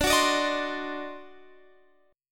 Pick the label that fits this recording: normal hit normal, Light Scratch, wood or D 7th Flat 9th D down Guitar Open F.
D 7th Flat 9th D down Guitar Open F